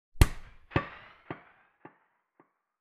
kick bucket noise sweep; hofa plugins

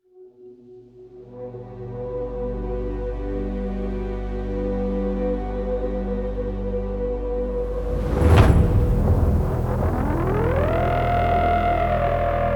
low drums, engine percussion; schallenberg engineering

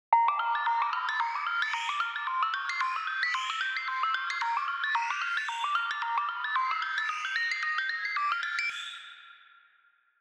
shimmer lead arp; anise glide reverb; audio spices

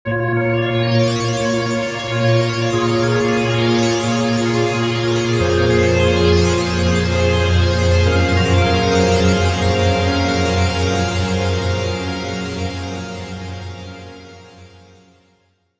glory filter sweep 90bpm; vitality vol 10

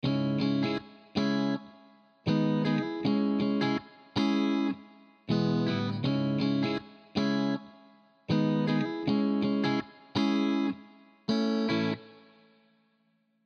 strato wet chor; Chiqui Audio; Chord Progression 58 160 Bpm C Min; Fender Stratocaster

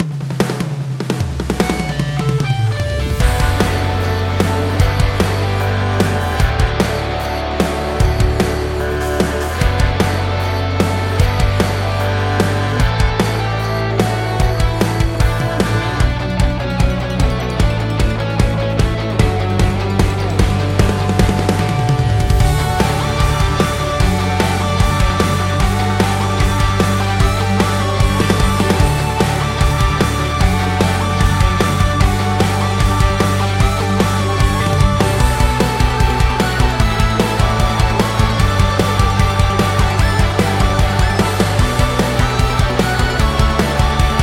pop pank drum kit; theme song; polarity audio

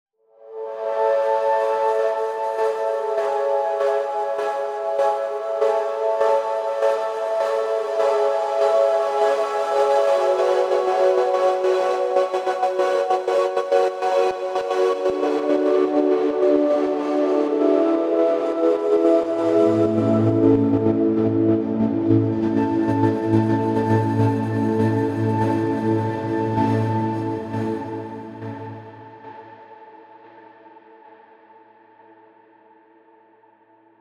ethereal gating; midigator; sonatura audio

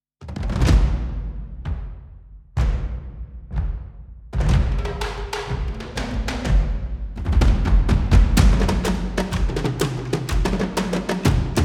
tom, epic ensembles tom; epic ensembles tom factory by fracture sounds

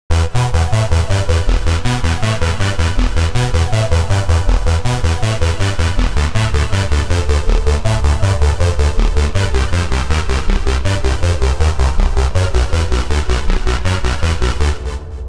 beryllium arp; the space soundset

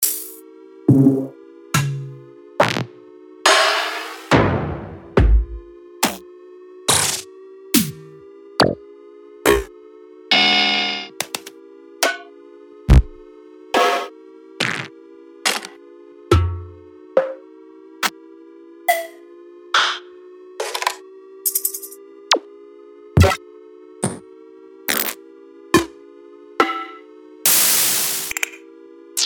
percussion; revealed percussion vol 3; revealed recordings

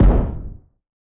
gym rocking stomp kick drum; hoops drums; drum kit; nova sound

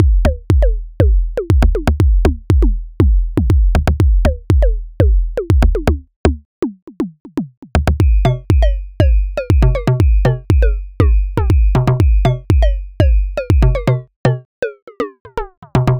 body only soft; oh snap; mne

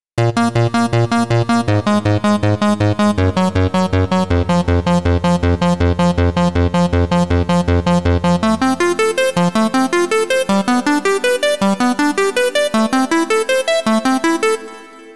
arp rhythm; the transmitter soundset

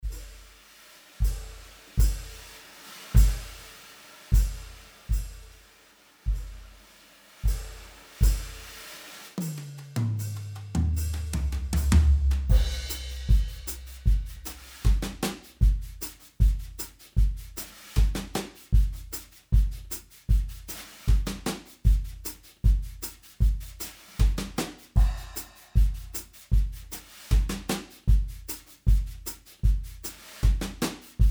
pop brushes, drums; gorangrooves; handy drums

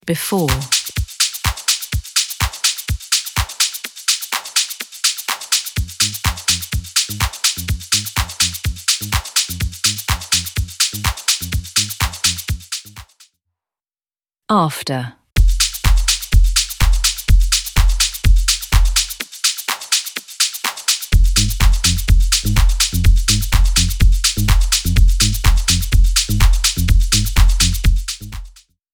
house

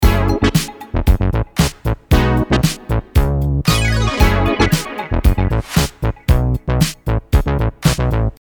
synth bass; synth bass center by audiolounge soft

